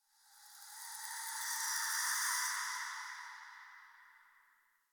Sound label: Wind Spine Grass Scream Gust; Ambience; Misc Outdoor; Warframe; Infested Eris